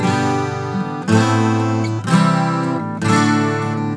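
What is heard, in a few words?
120 G GUITAR, Celtic; USB Soundscan vol.42 - Celtic Flavours; AKAI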